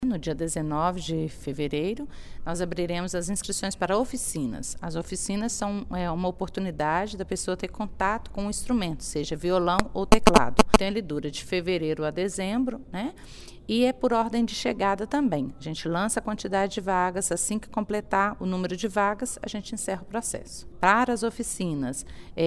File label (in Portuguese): musica